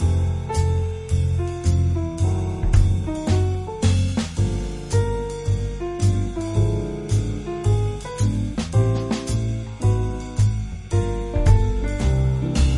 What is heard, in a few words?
bg music; freecell solitaire